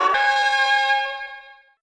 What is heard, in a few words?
new best score; Politburo